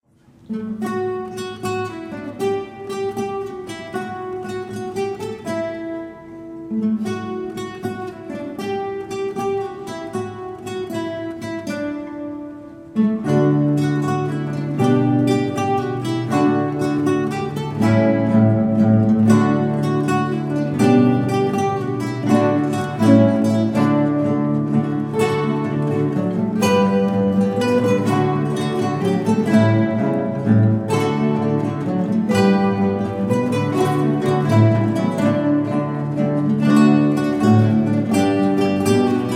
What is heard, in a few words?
jig, guitar